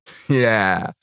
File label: laugh